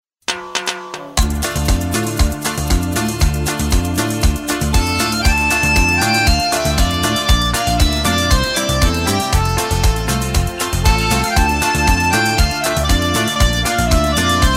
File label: Trumpet